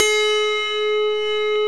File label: AUTOHARP; Roland - LA Composer vol. 1